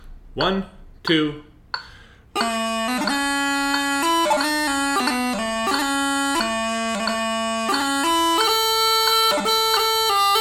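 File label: 90bpm, Chanter Recording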